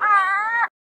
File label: gull; action RPG game